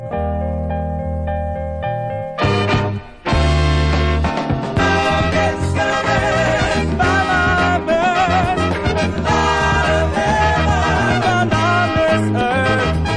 vocal

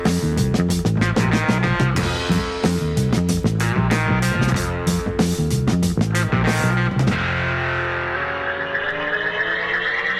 Strong Beat

